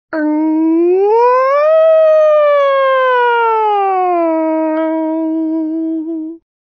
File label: ng siren